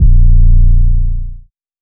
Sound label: 808 A#, Hip Hop; 808s; Cymatics; Cobra Hip Hop Sample Pack